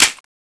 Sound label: clip out; tfa cso; gilboa viper